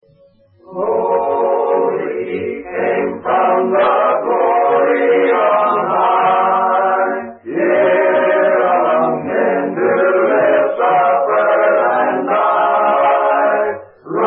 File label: a capella hymn